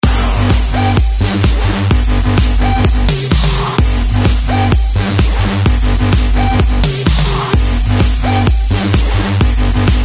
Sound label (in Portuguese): trilhas dance